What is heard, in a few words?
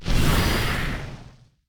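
evil Earth Servant death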